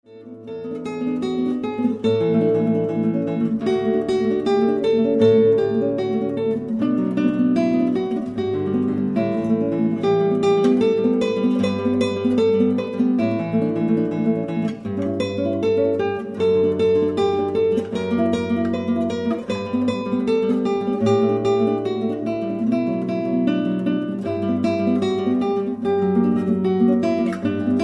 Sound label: variation on o canada